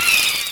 Fan Chant; Mario Paint

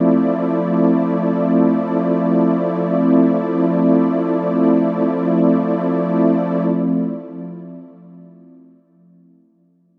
pad skyscrape F

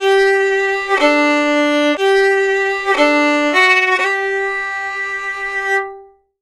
Fiddle, Appalachian

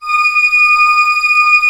ORCH A5 PP, Orchestra; Sound On Sound; Peter Siedleczek's Orchestra

